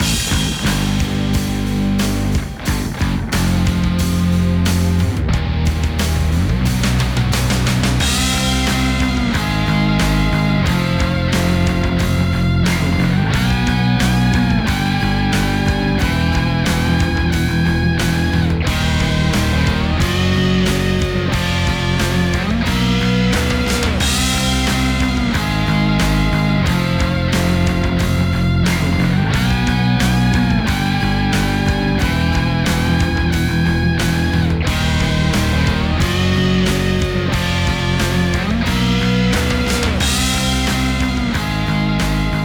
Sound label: Opponent Goal; Boom Boom